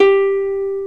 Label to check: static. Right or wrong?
Wrong